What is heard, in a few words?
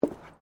FOOTSTEPS WOMENS LOW BOOTS BOARDWALK STEP